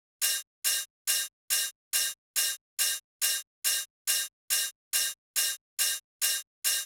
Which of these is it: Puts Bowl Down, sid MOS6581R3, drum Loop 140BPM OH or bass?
drum Loop 140BPM OH